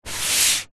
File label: household book slide table